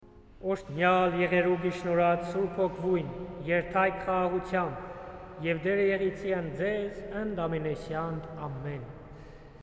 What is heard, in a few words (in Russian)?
Армянская месса; Будьте благословенны